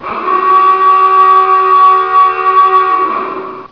Sirena, Doprava; Revelation Sight and Sound SK

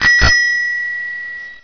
Bicykel; Mesto a dvor; Revelation Sight and Sound SK

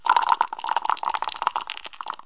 Hod kockou; Revelation Sight and Sound SK

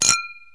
Xylofon; Revelation Sight and Sound SK

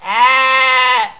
Ovca; Mesto a dvor; Revelation Sight and Sound SK

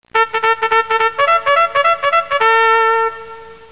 Trubka, Efekty; Revelation Sight and Sound SK

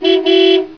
Tutut; Doprava; Revelation Sight and Sound SK

